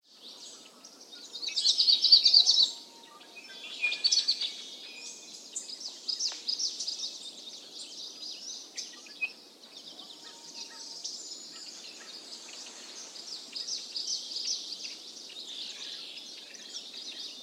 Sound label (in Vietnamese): chim keu trong rung nui